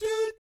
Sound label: DOOT A4, Vocal Jazz Fx; Spectrasonics; Vocal Planet Vol.3 Jazz Fx